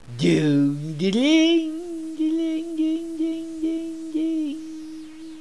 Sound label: startup; Strongbad; Adium